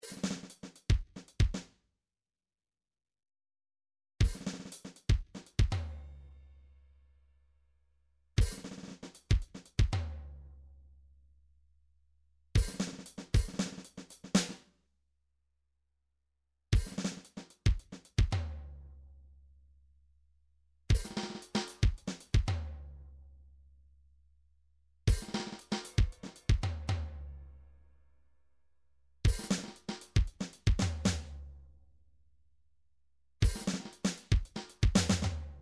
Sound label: drums, midi; Paul Kodish Dangerous Drums